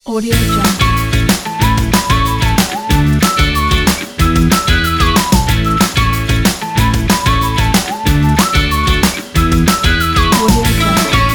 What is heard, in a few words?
happy; loka-music